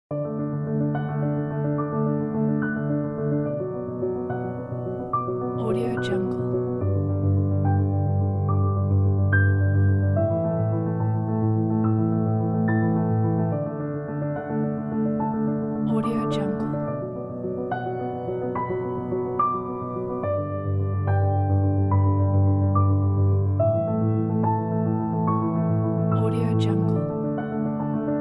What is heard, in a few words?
optimistic fast piano